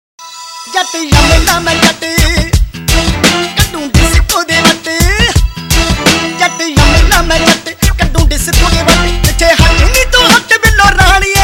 Punjabi Remix Tones; Punjabi Remix Ringtones

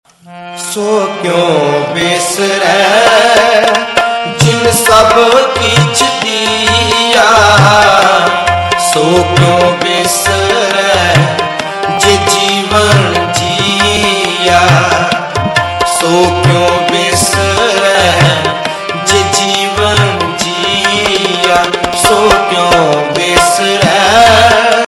Gurbani Ringtones; So Kyun Visre Jin Sab Kuch Diya

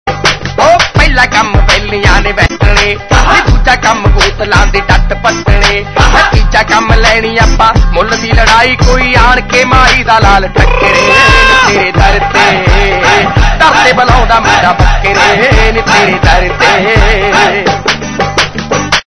Msg Ringtones

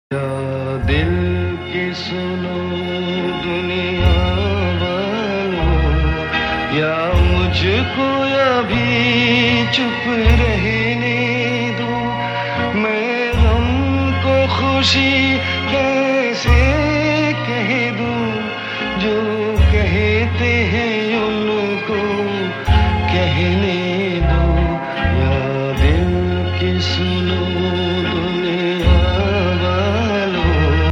Hindi old Sad Ringtones; Hindi Movie Ringtones

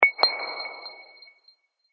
Soft, ringtone; HTC Mobile Ringtone

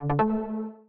Low Battery, ui; Mi Mix 2S Stock Ringtones

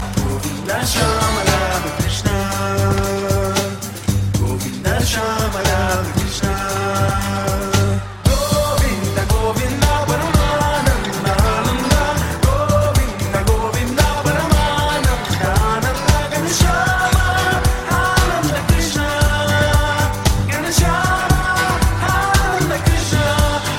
rap krishna; Navratri Special

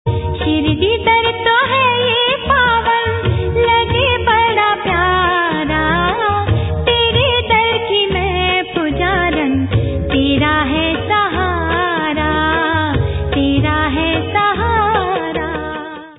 Devotional Ringtone; Lord Shirdi Sai Baba Ringtones

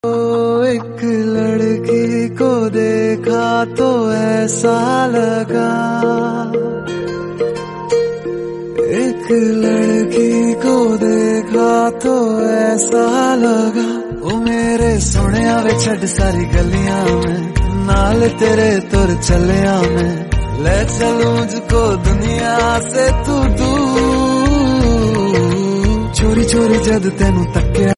Beautiful Ringtone

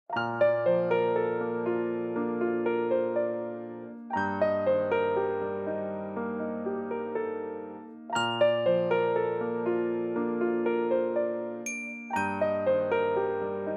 alarms; memory; OnePlus 6 Ringtones

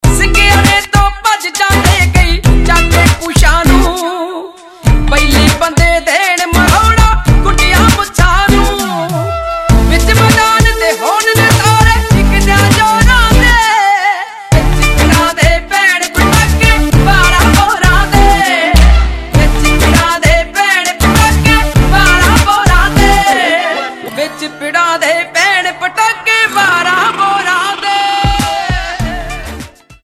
Punjabi Remix Ringtone

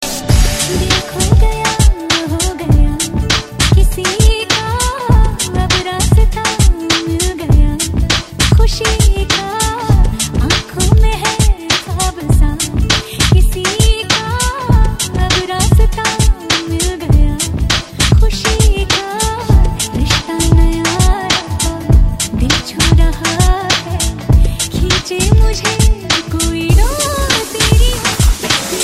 Safari Lounge Mix, Hindi Remix Tones; Hindi Movie

